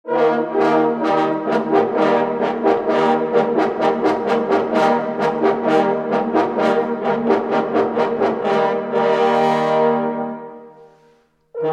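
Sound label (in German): FUCHS TOT Jagdhornbläser; Naturns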